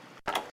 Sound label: Item Browse